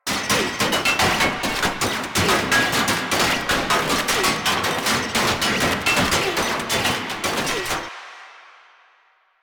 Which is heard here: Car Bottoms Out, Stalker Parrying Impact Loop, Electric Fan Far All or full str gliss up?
Stalker Parrying Impact Loop